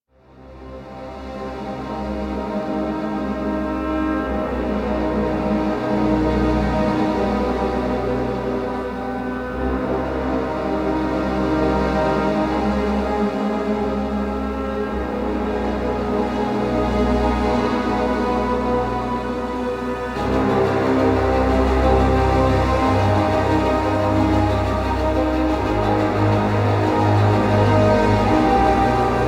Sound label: Room Transform Cue; Warframe; DE; Entrati; TennoCon2023